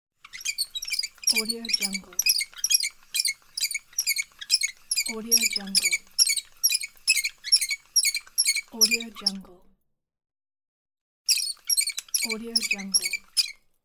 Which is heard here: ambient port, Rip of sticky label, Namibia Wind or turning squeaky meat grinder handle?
turning squeaky meat grinder handle